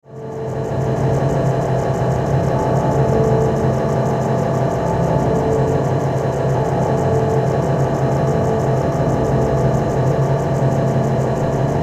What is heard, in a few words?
Drone Dark Rotating Motor; Blastwave